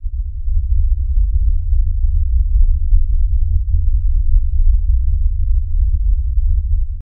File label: Earth Rumble Loop, earthquakes